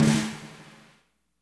WRM SNR, WARM+FAT KIT; Spectrasonics - Burning Grooves CD2

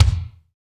BD, BD Dance; Sound & Vision; Gigapack I CD 1

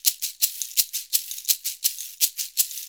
SHK, Shaker; Univers Sons; Basicussions

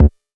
Bass bd, Jomox Airbase99

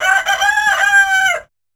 Rooster; Yamaha PSR-E323; Sound Effect Kit

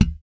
6-STR FUNK, bass; Spectrasonics; Bass Legends

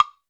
woodblock; Greytsounds; Scott Peers Sound Engineering

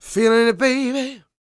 SING W, Vocal Planet Blues & Soul, BLUESY; ILIO

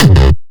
bass kick

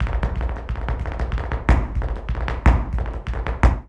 Flamenco, ZAPATEADOSOL

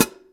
FOOT HAT; E-MU Formula 4000 Series Vol. 5; Protozoa; Phatt Presets

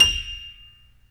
StereoGrand, Keyboards

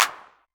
909 Clap, Novation Drumstation

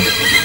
GUI Ac. Fx, GUIT AC; Best Service Gigapack 2

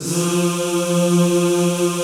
ZZZ F2, Classical Choir; Best Service; Extended Classical Choir

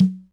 Yamaha RX-120 Conga Low

